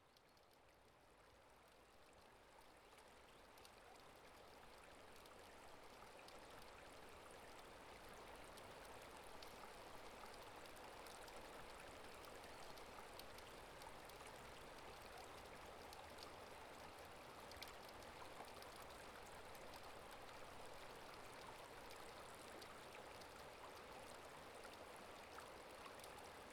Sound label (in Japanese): 釣れたて野外調理（川+焚き火。魚をさばいて調理する音）